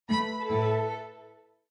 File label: Windows Logoff Sound